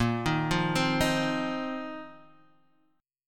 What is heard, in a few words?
A sharp Suspended 2nd Suspended 4th A sharp, Guitar, Standard; arpeggiated; A#sus2sus4; JGuitar